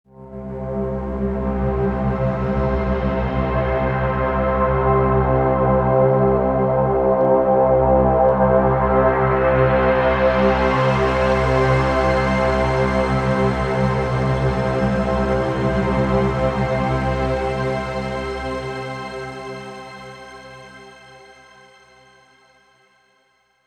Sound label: Downtown Overlay Drone; Mirror's Edge Catalyst